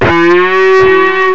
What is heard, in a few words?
guitar wail; jazz jackrabbit 2